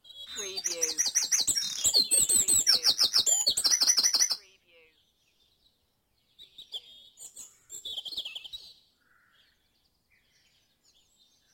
Dawn Chorus in Mold North Wales - Short Loop

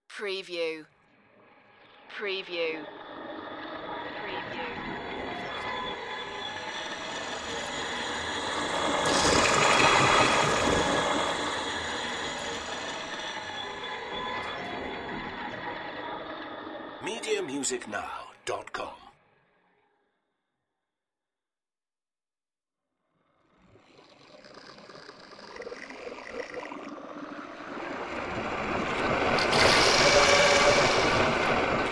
glitch flutter swooshes

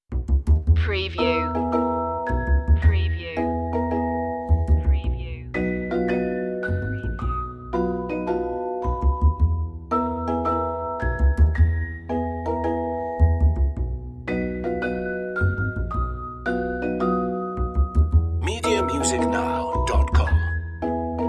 strings and mallets only

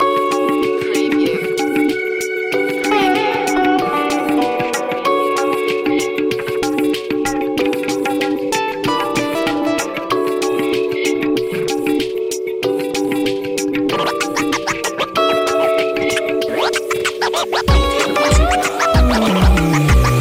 hop to da beat loop

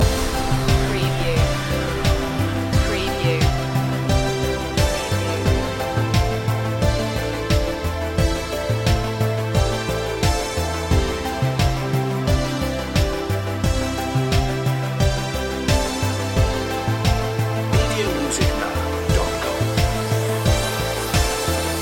falling blossom loop

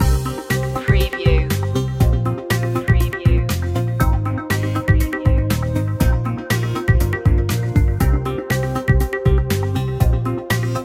smart beat loop

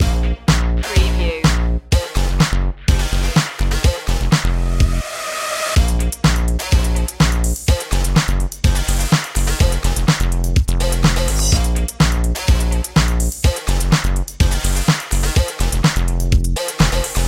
pulsating and uplifting loop

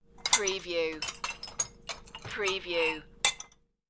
Glasses

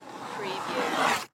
Cardboard Slide Move

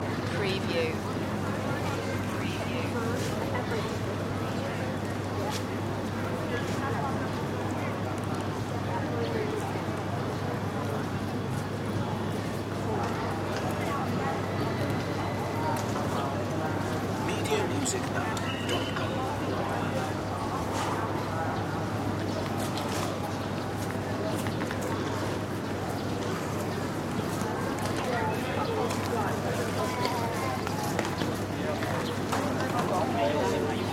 bangkok train station ambience; loop

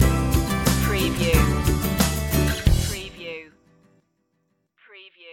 happy journeys stinger

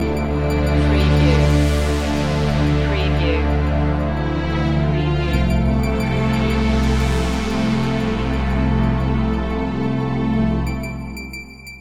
loop end

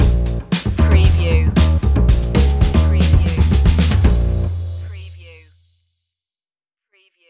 funky jazzy flow stinger

